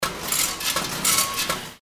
candies filling machine

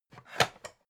pan stack

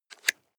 nylon leather clip

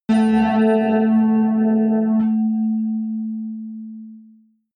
synth bass bell high

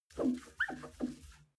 clean window paper towel soft

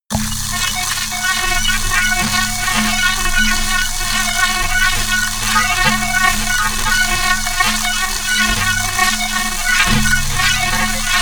atmosphere crazy didgeridoo high

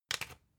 usb port metallic in

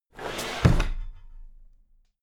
drawer cabinet equipment close heavy

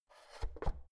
oven knob press out

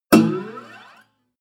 bottleneck strings slide up damped

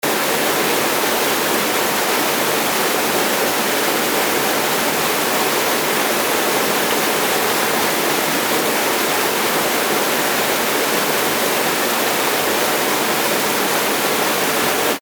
stream very strong

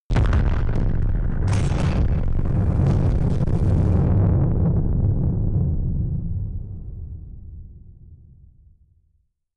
distorted explosions

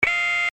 alarm

